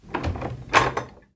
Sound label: door open; the 39 steps